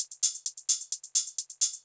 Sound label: drum 130 hihat, Techno Trance, Drumloops